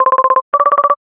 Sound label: ringer, chirp